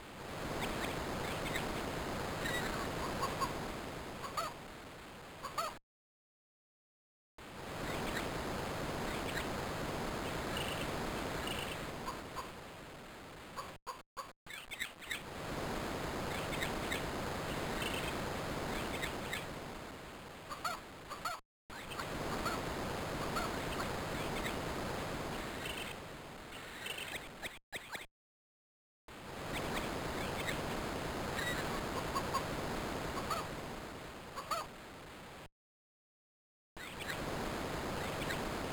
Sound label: Ambience